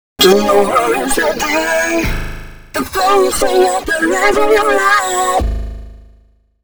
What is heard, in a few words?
female c#, Dance Ultra Voice FX; Mutekki Media